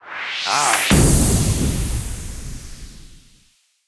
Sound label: Respin Anim; alohaxmas